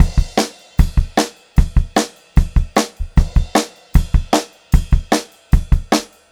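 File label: 152 speed 2 variation, pop rock drum loops; USB Soundscan vol.37; AKAI; 90 s Sample CDs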